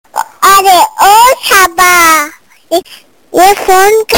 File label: Samba